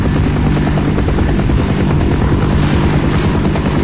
osprey rotors

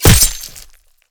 hit, knife; cof; guns

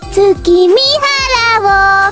padoru song

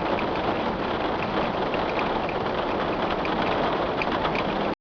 rain window

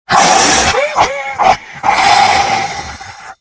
haha; rustbot; lizard

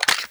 de mag out, weapons deagle; cofdeagle

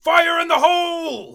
throw; darkwing

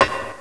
metal

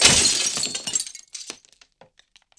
glass

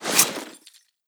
draw, wpn

